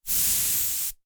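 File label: Air swish long left to right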